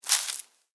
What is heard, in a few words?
Footstep: Individual Footstep On Dry Leaves